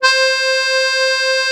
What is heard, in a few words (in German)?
AKK VIOLIN, akkordeon; Best Service; Alpine Volksmusik